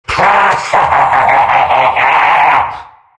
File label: big laugh; Undying